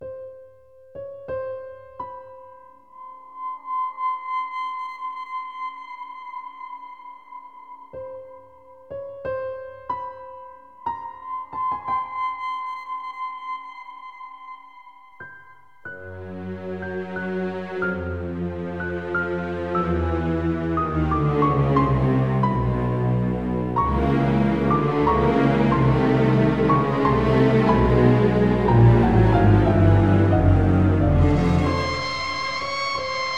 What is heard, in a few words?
menu music; zombies on a plane